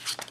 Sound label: pickup